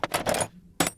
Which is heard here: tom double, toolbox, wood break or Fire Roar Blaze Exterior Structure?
toolbox